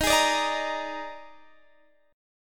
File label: Eb Major 7th Suspended 4th, Guitar, DADGAD